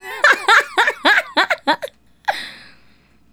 Female Laugh; Laughs; Sonic Foundry (Sony Creative Software); Vocal Toolbox

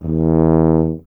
BRS TUBA, Solo Instruments

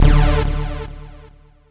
Synth Hit D; Trance; Synth Hits; Zero-G Trance Inducer; 90 s Sample CDs

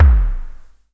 Deep Low, ANALOG; Roland MV8800; ANALOG CRAZE

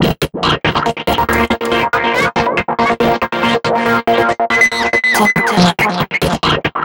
Envelope Beat; Drums & Drones

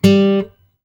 GUI Ac. G, GUIT AC; Best Service Gigapack 2

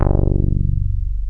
Korg Poly-6

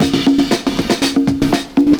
PERCS, 70 s Breakbeats

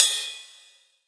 Ride Cymbal

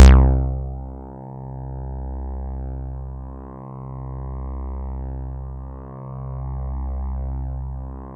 C3, Synth; 90 s Sample CDs; NiceBeats; SynthLine VOL-21

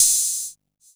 Hat, Drum & Bass

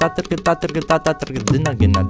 INDIA L, IND ENS PH, Heart of Asia

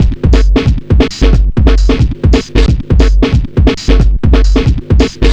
090bpm, Downbeat Dance Grooves

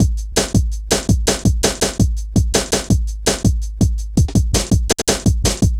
Ezee Rolla, Drum Loops; Zero-G Jungle Warfare 1, 2 & 3

